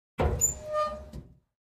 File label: Cabinet Door Open